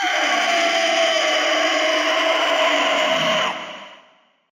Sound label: dinosaur scream; SoundsCrate